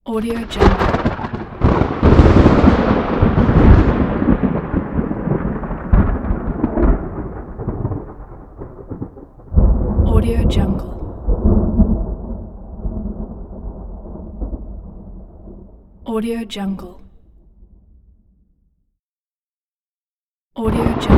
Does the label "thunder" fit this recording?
Yes